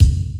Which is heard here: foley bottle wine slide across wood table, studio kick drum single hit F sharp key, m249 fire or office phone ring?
studio kick drum single hit F sharp key